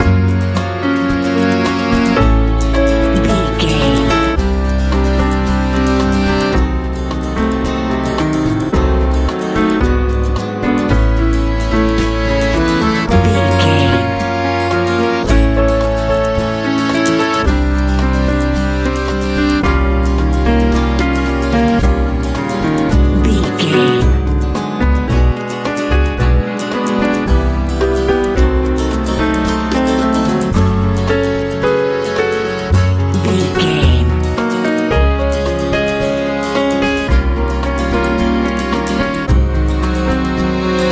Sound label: hopeful warm fangs bite big love easy pop